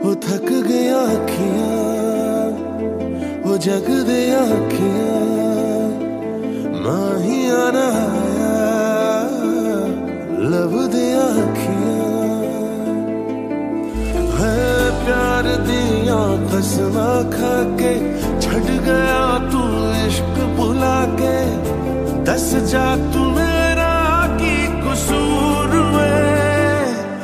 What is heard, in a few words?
ringtone, bollywood ringtones